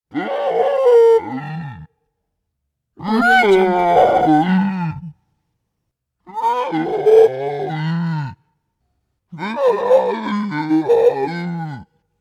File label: humanoid creature voice scream yell call out